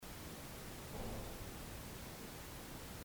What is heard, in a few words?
Boiler room thump; Erebus